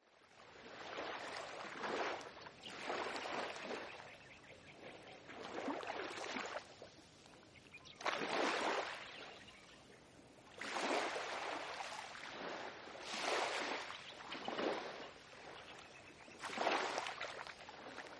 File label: waves